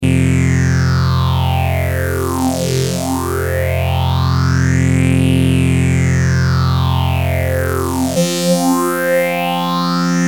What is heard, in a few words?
prodigy mutron phasor II